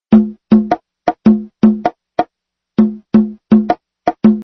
drums

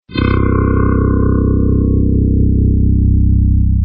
synthesizer

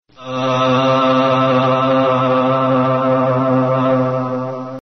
synthesizer

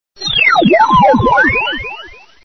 synthesizer

